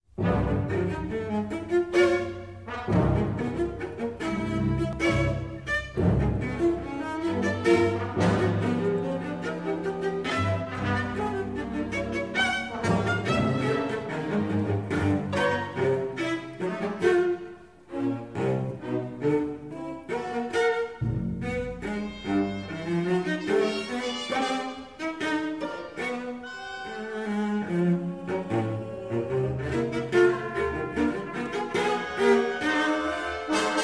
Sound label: Vogel Concerto Vlc. Orch.